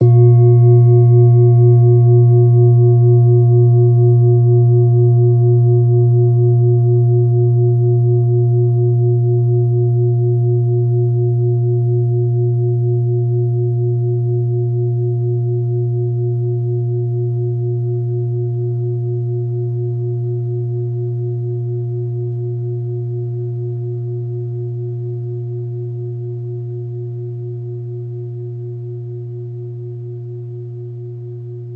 bowl soft A#1 mf; healing soundscapes

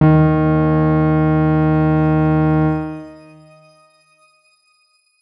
X Grain D#2 pp; healing soundscapes; Ableton

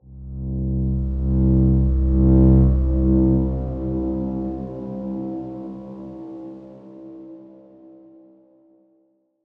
Darkswarm C1 mf; HSS Ableton Pack1

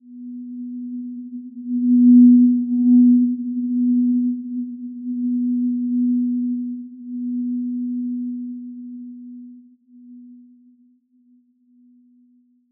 Simple Glow B3 f; healing soundscapes; HSS-Custom-1

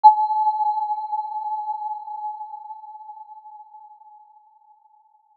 Aurora B5 mf; healing soundscapes; HSS-Custom-1

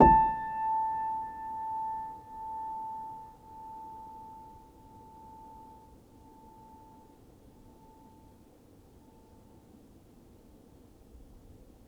Upright Piano; HSS OP Pack; healing soundscapes

